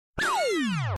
Funny Alert

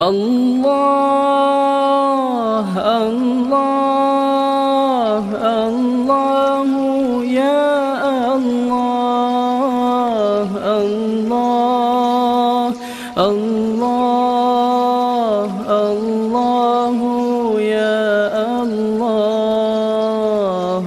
Zikir Allahu

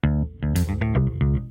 La Dee Dah Dah bass

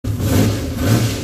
86 chevy fire

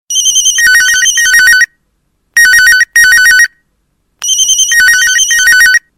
Quality Mixed Ringer

